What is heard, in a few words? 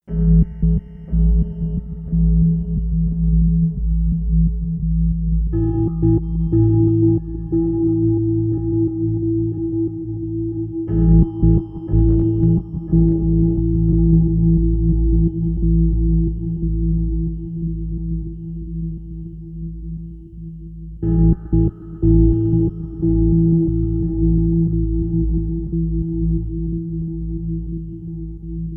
sequence dark future jazz